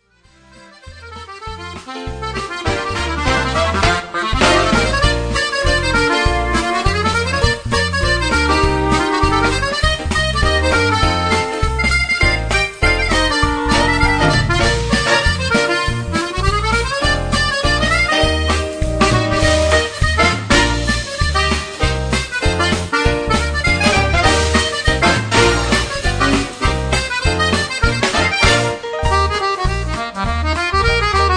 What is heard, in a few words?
charleston swing